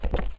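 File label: gritty cement land, footsteps; chief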